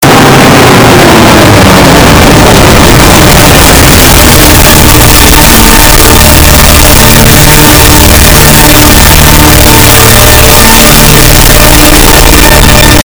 earrape; thx

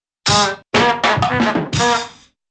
fall and tumble

